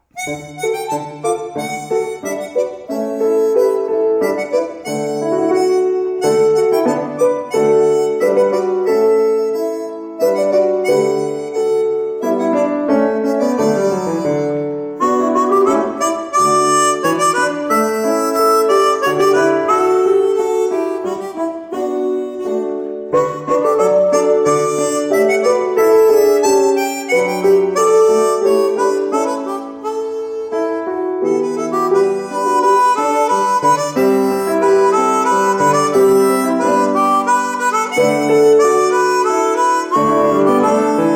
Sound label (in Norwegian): Andante leggero, instrumentalsolist og piano; 1. sats fra Suite nr. 1 for instrumentalsolist og piano